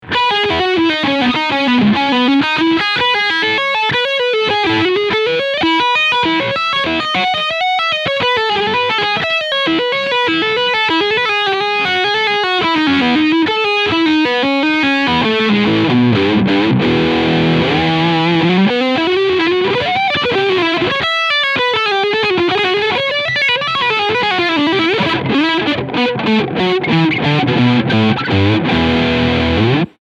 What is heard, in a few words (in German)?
ESP LTD SN 1000 Leadsound Steg Humbucker